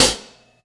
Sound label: Closed Ball Hi-Hat; Drum n Bass; Hi-Hat One-Shots; KJ Sawka; Drum n Bass & Beyond; Drum Tool Box